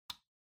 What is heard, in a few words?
Light Switch Box Rocker Dry Gentle